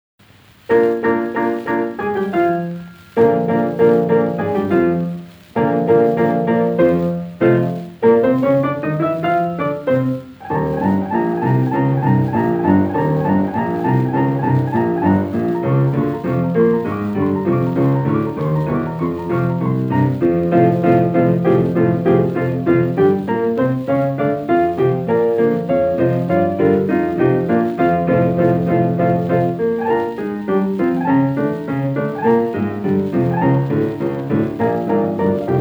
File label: BLUES